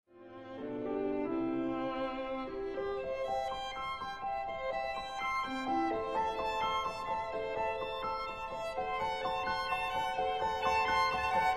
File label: Sonata for violin & piano No. 10 in G major, Allegro; The Cockcrow; Op. 96